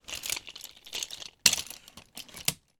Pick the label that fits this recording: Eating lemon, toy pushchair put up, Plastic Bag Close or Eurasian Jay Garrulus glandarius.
toy pushchair put up